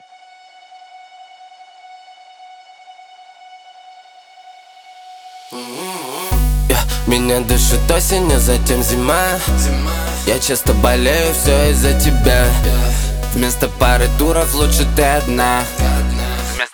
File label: solo